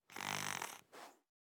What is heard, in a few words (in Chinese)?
狐狸睡觉声